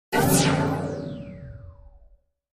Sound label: Hit Laser Fire Chime